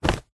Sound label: clothing jumper fast short movement flap could be punch movement; zapsplat